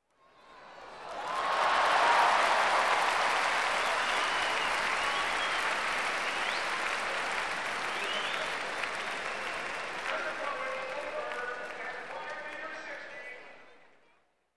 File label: Fans in the crowd applause and cheers